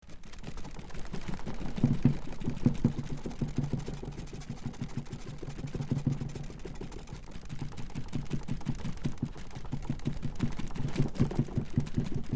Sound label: Rattles Wood Book Shelves Steady Rattles; wood stress